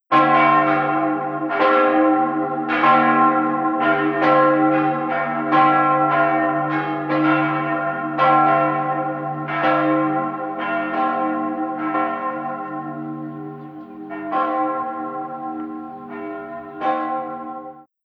Bells; Lasagrada Bells

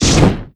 Gimmick Framewall Hit; Disney Star Smash; Android, iOS, Mobile

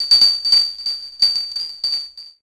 bell; Higurashi no Naku Koro ni Matsuri: Kakera Asobi; PS2

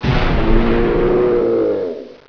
Die; Molo Die

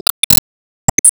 Sys Emotion Amaze

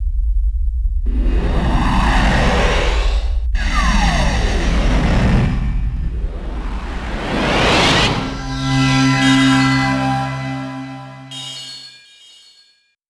Bootup; neo geo cd bios; snk; Neo-Geo CDZ

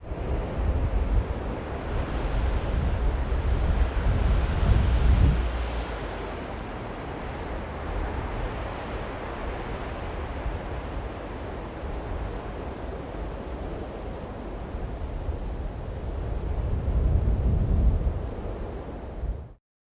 Namibia Wind

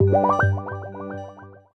Jingle Stage Clear; super rub a dub; ps3

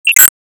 Enemy Daruma Strike

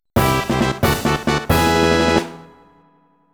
Slot Car Derby Start; Mario Party; N64

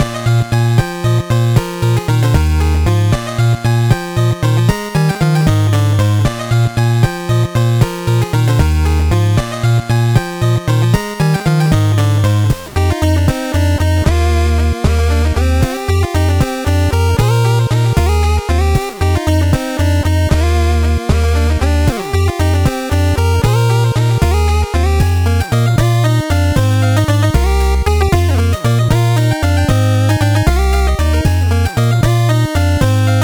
Tavern; Slayin

